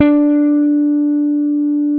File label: SPEC SUSTAIN, Bass